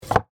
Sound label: Wood Object Move, Movement, Slide, Close; Foley; Wood Sound Effects; Wood (Sound Effects:Materials; BlastWave